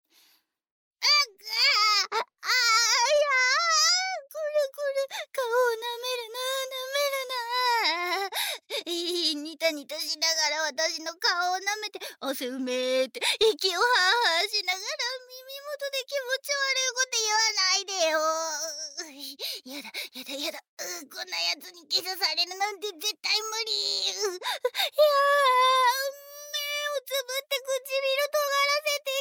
濃厚ディープキス, ASMR; 藤田と濃厚ディープキス; SEなし; 生理的に無理な豚オスの恋人奴隷になるまで～授業でキモメンとセックスのペアにされて～

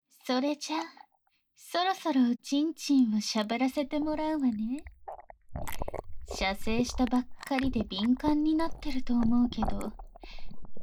耳舐めされながらどすけべお姉さんにフェラされ、ご褒美中だしHする音声; 【淫語囁き】どすけべお姉さんの催眠アプリモニター弄び。ねっとり焦らし逆レイプ【強制逆転あり】

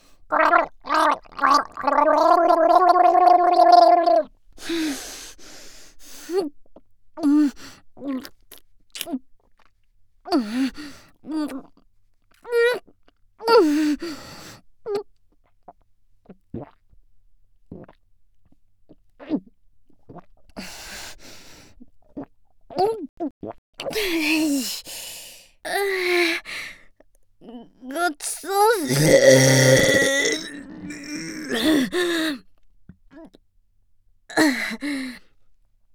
濯ぎうがい ゲップ; ザーメンゲップ集

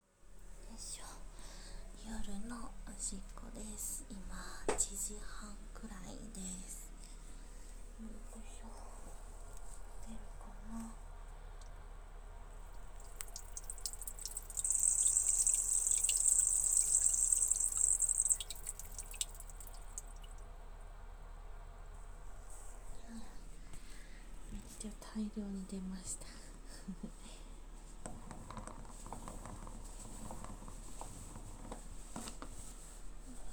トイレでオシッコ！; ３日目・２０時の; 実演音声

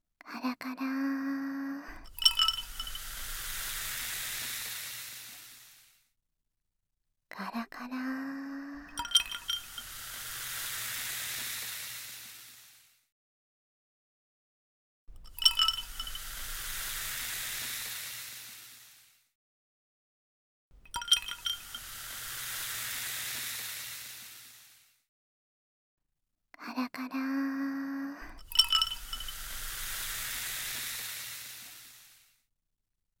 炭酸カラカラ ループ 左 環境音なし; ASMR/炭酸綿棒/氷のカラカラ; 一途で健気な後輩彼女はあなたの心と体を癒したい ～田舎彼女と甘い夏のひと時～; KU100

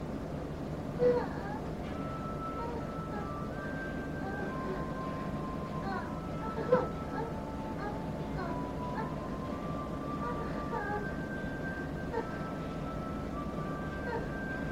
アジア 美魔女, 喘ぎ声; チャイナエステ1; ガチ実演; 熟女

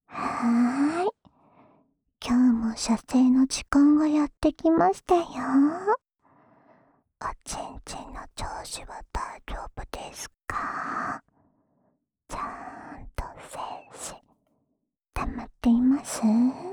オナサポ用耳舐めループ（右耳）, ASMR; 耳かきリフレ『春乃撫子』へようこそ♪～至極のロリ店長と、癒やされヌかされ温泉旅行。着床オプション付き!

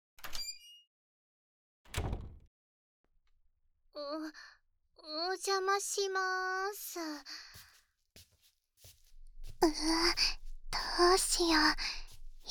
梵天耳かき, ASMR; ちゅ～するまでの3ステップ～ツンデレ幼馴染彼女と秘密のLesson～【バイノーラル】

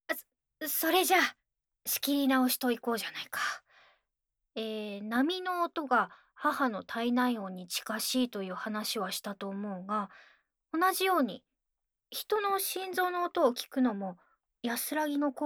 心音 ささやき; 『心音を聞くと、人は安心するそうだ（心音／ささやき）』; 理系JK

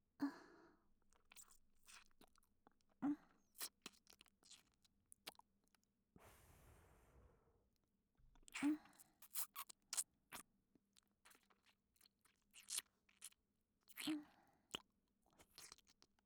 キス; 【マゾハーレム】

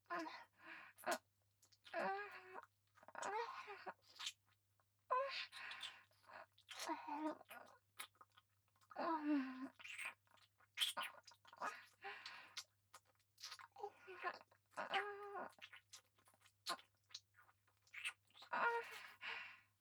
乳首舐め; 性欲旺盛な陸上部の先輩後輩JK、ベロチュー青春溺愛えっちでコーチの汚ち◯ぽ生ハメ特訓♪

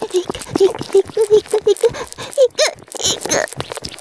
絶頂, ASMR; 騎乗位

